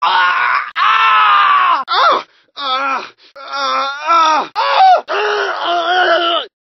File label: pedestrian voices coughing pain male; gta san andreas